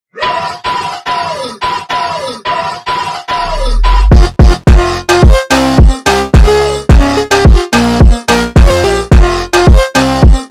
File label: Raaah Funk slowed